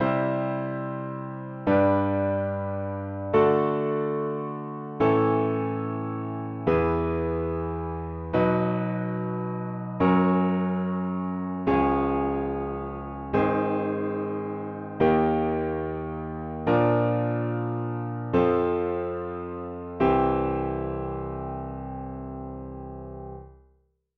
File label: Meantone triads